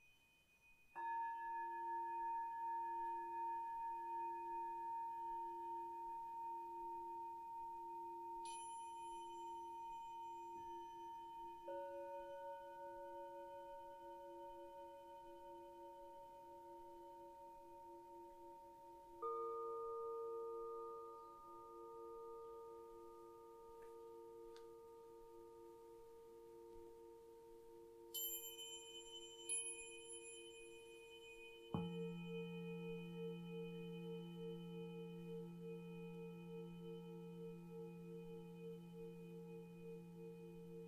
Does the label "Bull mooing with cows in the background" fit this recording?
No